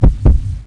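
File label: heartbeat; zoochosis panda; zoochpanda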